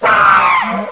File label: headcrab attack; svencoop